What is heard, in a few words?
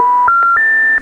error